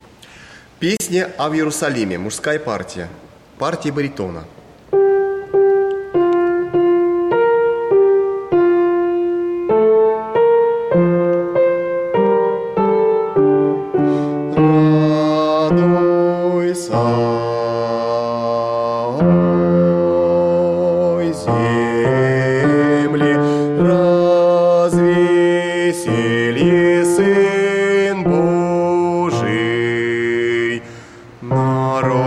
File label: A v yerusalime muzhskoy, ruschoir